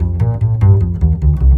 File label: BASS, Drum & Bass, 150 BPM